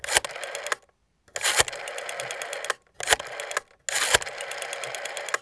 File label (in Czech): telefon vytaceni; Mafie 1